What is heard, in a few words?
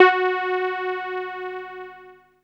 SIEL, String Machines